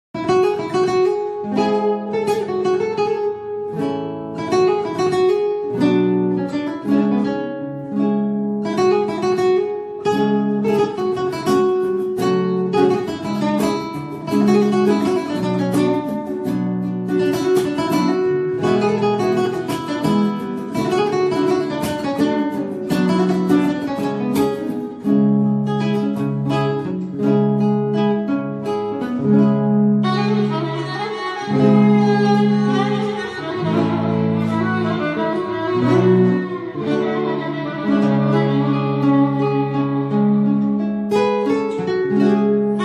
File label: Guitar Violin